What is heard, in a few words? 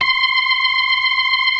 ORGAN, 4 DRAWBARS; Keith Emerson Signature Series